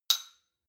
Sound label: shot glass clink medium, gastronomie; berufe; soundpool